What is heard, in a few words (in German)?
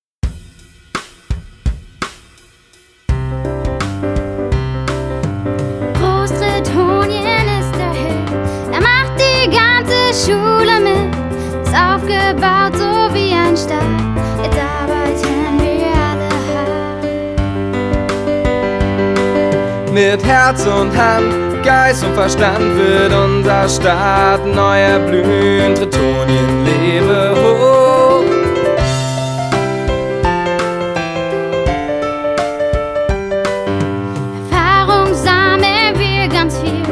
hymne; trittau